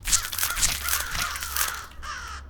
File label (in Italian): Beccate Corvi